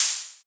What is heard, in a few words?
Open Hihat